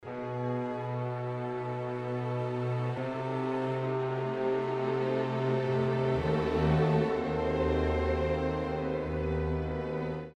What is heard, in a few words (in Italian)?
Tema del filtro di morte bassi